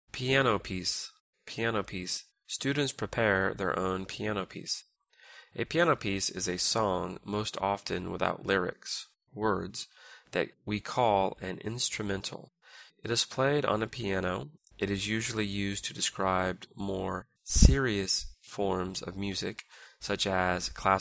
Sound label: piano piece